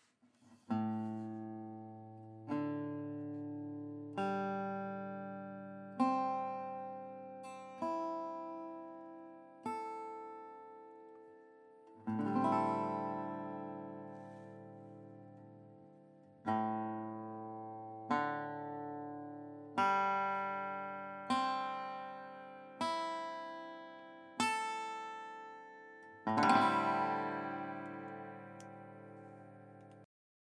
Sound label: Open strings